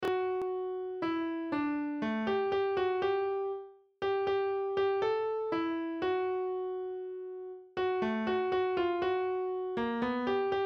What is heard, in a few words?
piano; John Jacob Jingleheimer Schmidt